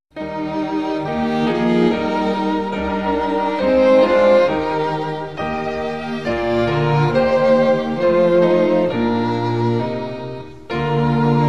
vocal and instrumental, hymns spanish

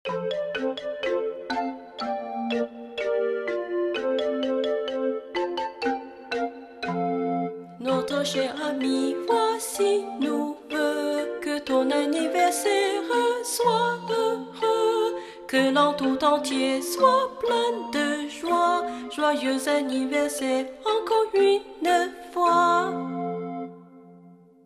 words and music; childrens songbook; french

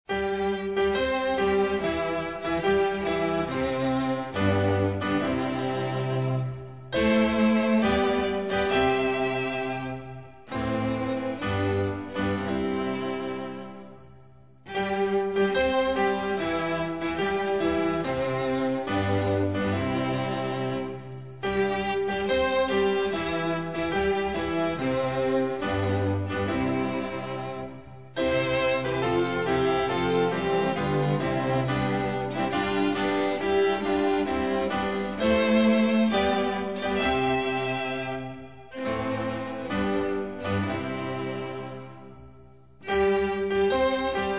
god speed the right instrumental; hymns portuguese

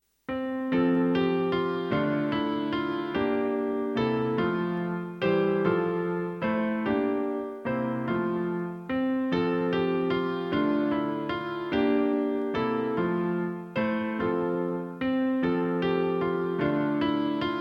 music only; childrens songbook; french

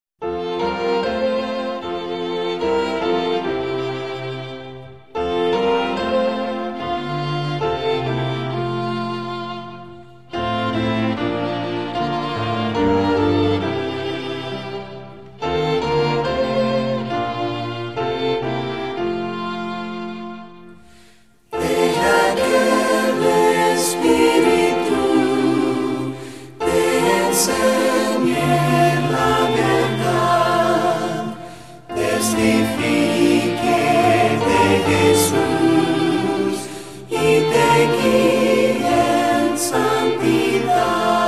vocal and instrumental; hymns spanish

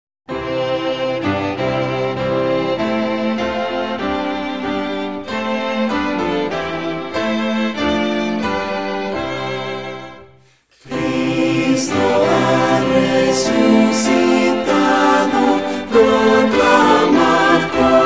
vocal and instrumental; hymns spanish

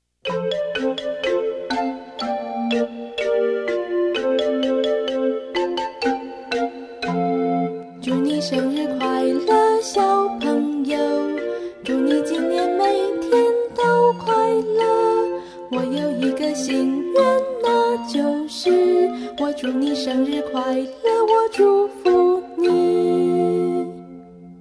words and music, Chinese; childrens songbook

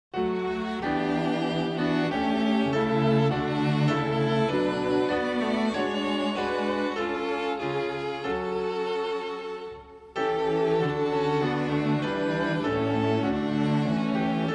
women instrumental, hymn

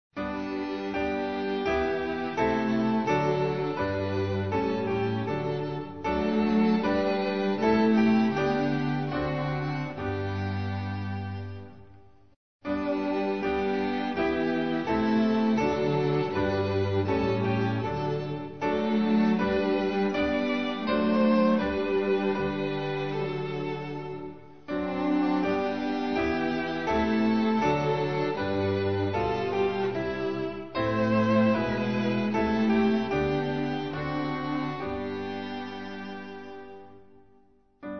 behold thy sons and daughters lord instrumental; hymns french

